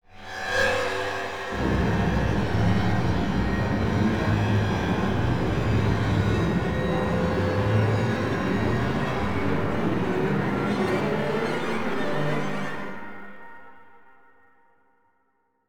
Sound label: Loading Scary